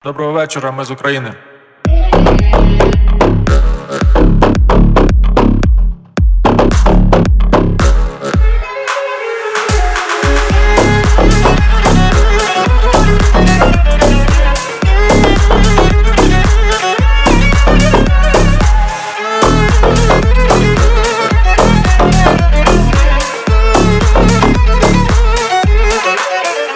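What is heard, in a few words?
melody for ringtone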